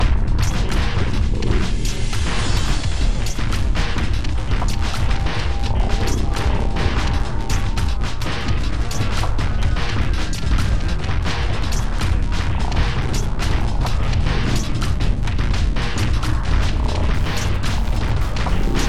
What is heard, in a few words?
soundscape; chimpbembly17b